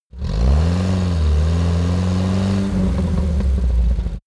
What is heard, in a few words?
car uaz 469 driving high rpm